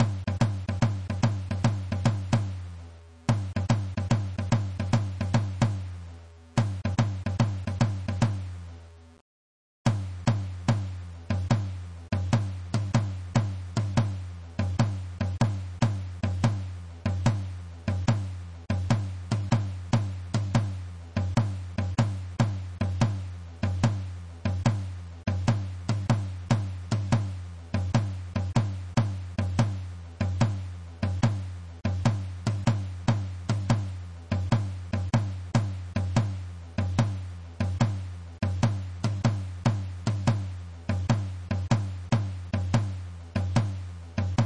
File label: funk low surdo